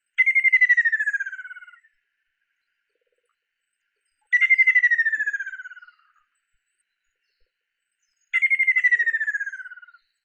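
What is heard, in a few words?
ruddy kingfisher song, birds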